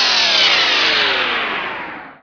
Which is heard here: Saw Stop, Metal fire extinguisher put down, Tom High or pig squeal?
Saw Stop